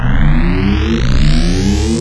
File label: heal player